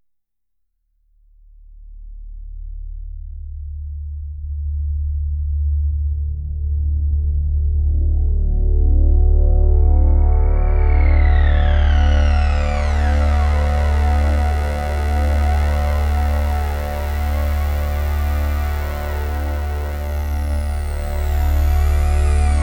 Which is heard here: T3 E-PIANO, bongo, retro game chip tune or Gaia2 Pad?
Gaia2 Pad